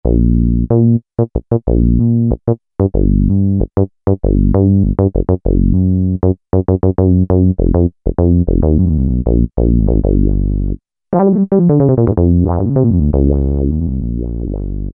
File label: SH-101 Bs 2; Dance; Roland SR-JV80-06